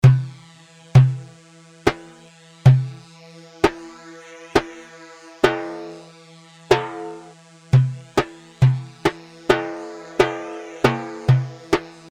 Merjaf; Merjaf 3 مرجاف

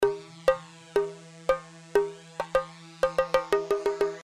Zeer; زير

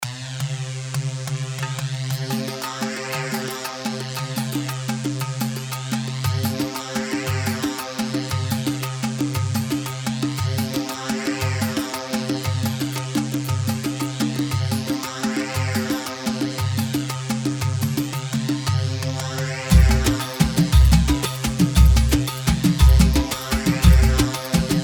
Setati 3/4 174, khaleeji

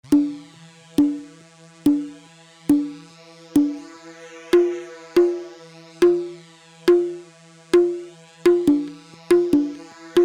Ras; Ras 2 راس

